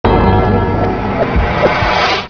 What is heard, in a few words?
skill hit